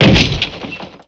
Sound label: rock hit